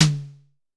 Drum X Snare; Pearl Drum-X

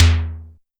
SIMMONS SD-5 drums

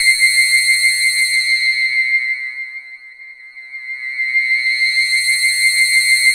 JV CLAS LEAD; Syntec; Wall of Sounds

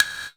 GATE PERC, effected drum; Crypton - DrumBase5000

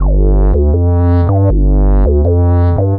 Metadata Bass, Drum N Bass; Sonic Foundry (Sony Creative Software); Knutrix Drum & Bass

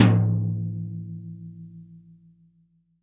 TOM, REAL DRUMS, SWING KIT

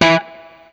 BLU POP, Guitar & Bass

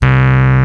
RHODES C2; Vintage

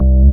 Single Acoustic Basses

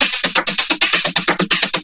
Vocobeat; Sonic Foundry (Sony Creative Software)

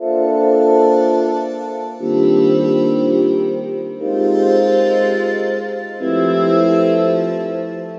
Diamond Strings; Strings & Pads; Sonic Foundry (Sony Creative Software); The Electronic Music Manuscript Collection

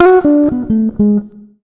120 JAZZ, Electric & Acoustic Guitar Loops; USB Soundscan vol.04; AKAI

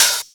Open HH, Cymbals

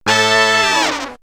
Stab, Horn Section; X-Static Goldmine; backtothe70's

